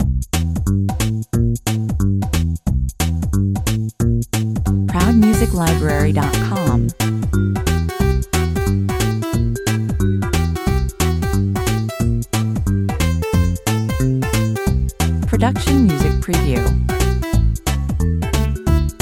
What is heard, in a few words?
funny level, 180 bpm